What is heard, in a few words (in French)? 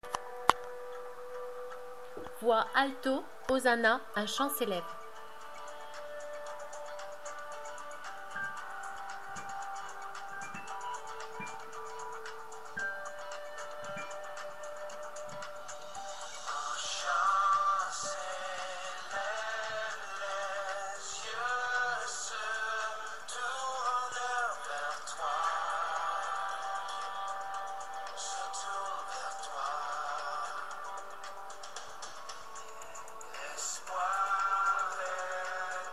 Hosanna un chant s'eleve Voix alto